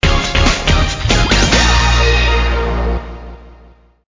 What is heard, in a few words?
Jingle Level Clear; Go Series 10 Second Run; DSiWare